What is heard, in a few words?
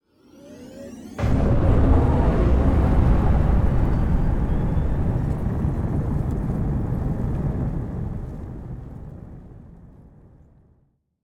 Ship Thrusters; Entry Seq; Skybox